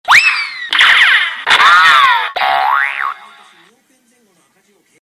Cartoon Mix

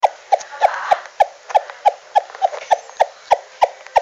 Cluck

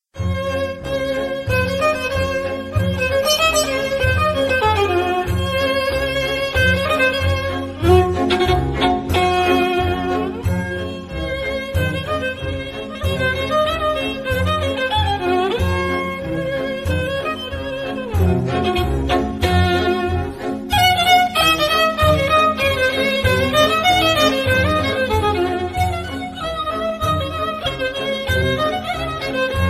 hicazkar sirto

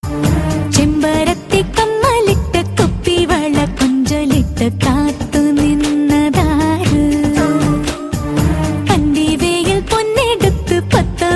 Malayalam Hit Song

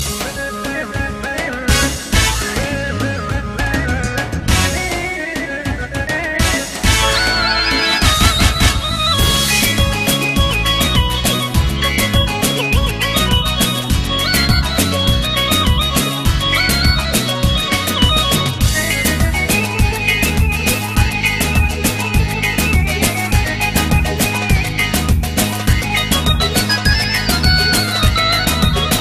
Cool Instrumental

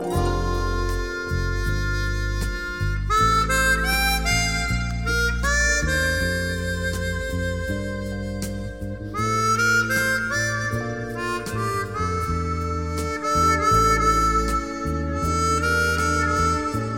Harmonica Sound

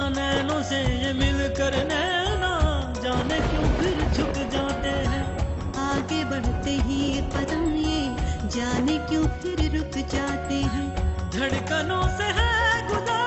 duet Song; Punarvivah

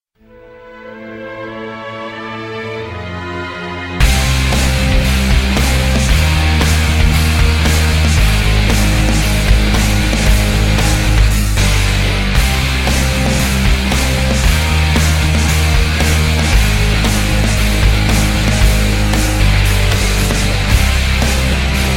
Sweet Violin

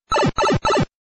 Pipe; SMB

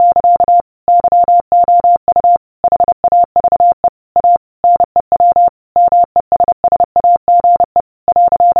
New Message

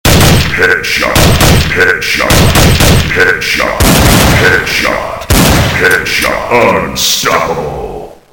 Headshot

